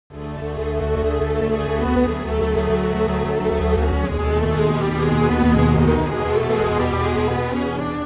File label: strings, timestretch; i3 dsp quattro x